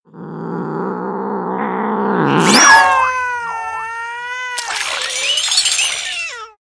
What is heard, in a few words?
Cat Fish